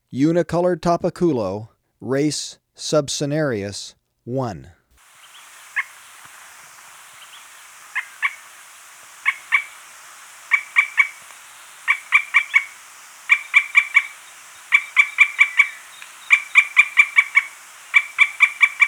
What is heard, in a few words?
Unicolored Tapaculo, Birds of Ecuador; S. America